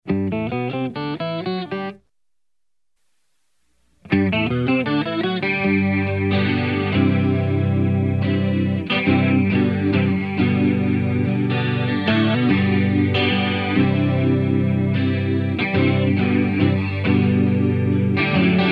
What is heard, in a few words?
Swirly Strums; Strymon Iridium → Strymon Lex